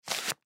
Money Bill Stack, Money Bill Stack Remove Single Bill Dollar; Money Bill Stack Remove Single Bill Fast Dollar; Money Sound Effects; Foley; Blastwave